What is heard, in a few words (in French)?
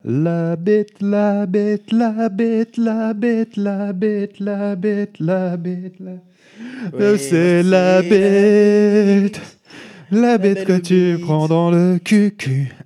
Sonnerie